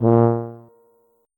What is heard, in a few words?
tuba note